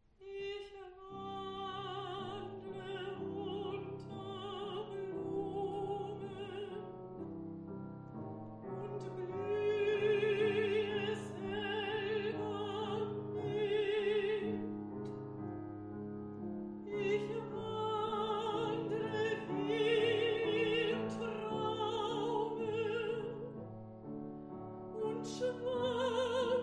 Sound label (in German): ich wandle unter blumen; alma mahler